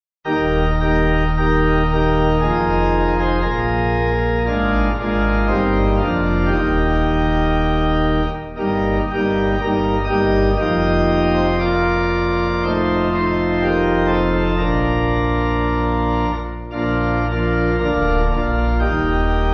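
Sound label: Pipe, Pipe Organ backing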